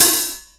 Open Cym, Mixed Percussion; Jungle; Percussion Hits; Zero-G Jungle Warfare 1, 2 & 3; 90 s Sample CDs

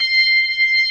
B3 C 6, ORGAN; AKAI S-Series CD-ROM Sound Library VOL-8

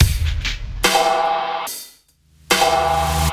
CB LOOP; Zero-G; Chemical Beats

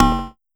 Pollard Perc, Syndrum

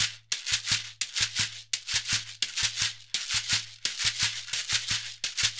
SHEKERE, Synclavier Percussion; ILIO

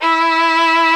STR VLN, Str Quartet, Strings

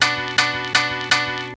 PIA FX, Hip Hop R n B; USB Soundscan vol.19; AKAI

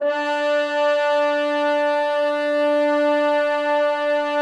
French Horns, D3; Best Service ProSamples vol.51 - Classic Orchestra 2

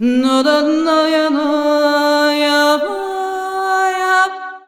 VOX, Balkanish Traditions; USB Soundscan vol.63; AKAI

